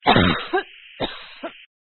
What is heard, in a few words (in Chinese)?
女生 咳嗽; renlei